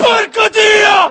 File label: combobreak; StorkMoon